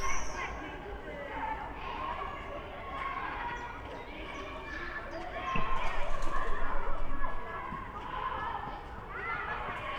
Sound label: screams